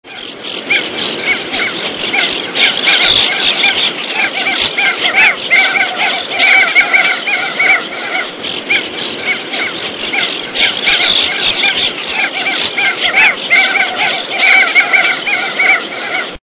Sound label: Crested Auklet, songs